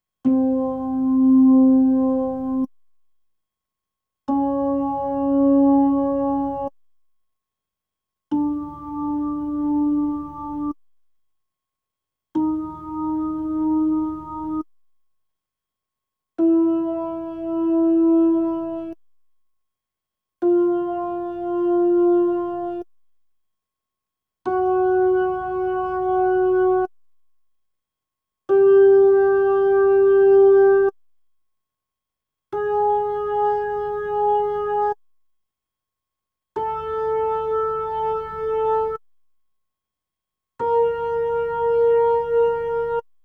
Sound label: B3 Organ; Masterbits CLIMAX 8